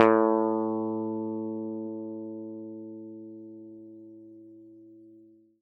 a3 open fff, swagbass

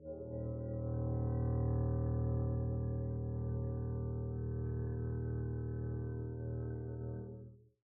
arco eb1 pp down, double bass

